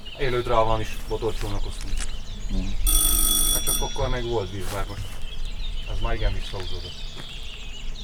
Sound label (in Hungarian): kulso kurblis telefon megcsorren; vasut kezi tekeresu telefon 2015; vizvar; cardioid